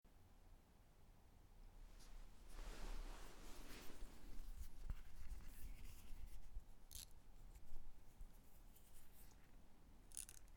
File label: Fan Noise